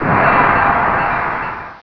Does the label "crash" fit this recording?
Yes